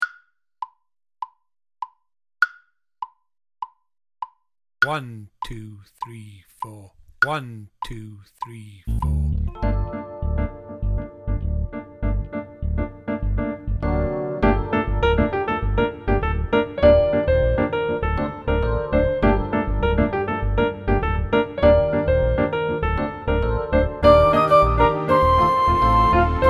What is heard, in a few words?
Backing, drum kit; Amazonia